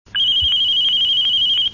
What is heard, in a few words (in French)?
sonnerie restau 2